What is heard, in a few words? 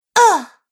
Woman Groans Ugh; Groaning Sound Effects